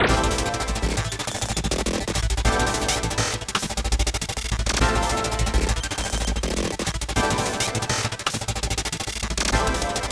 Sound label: Skate; Digicalized; Android